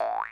Spring Bound; SMG

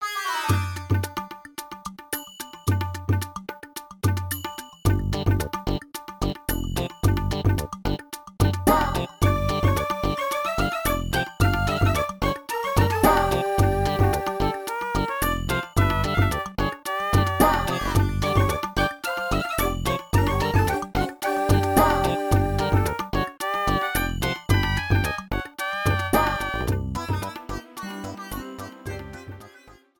Music Desert Overworld Theme; NSMB; New Super Mario Bros.

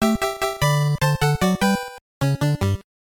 Game Over, Music

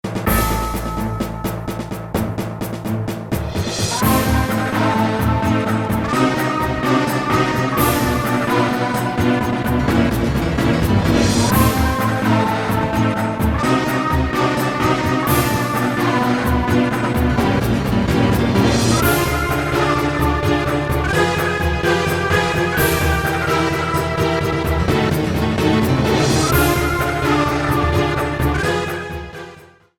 Bowser Land theme

